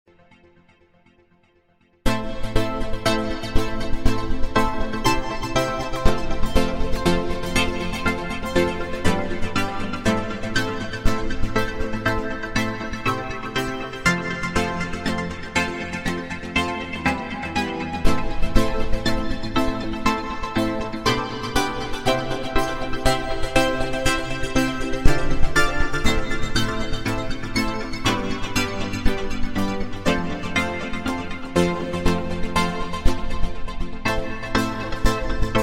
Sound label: OSTIRUS LFO SYNC